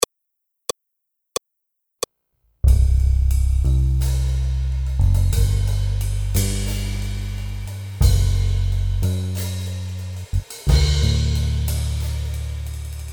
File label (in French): Accompagnement seul; American TV Serie Ambiance